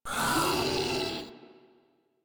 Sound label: Vocal Pain; Warframe; Proto Infested Ancient